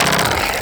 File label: Reload, Weapons; Warframe; Sapient; Sapient Primary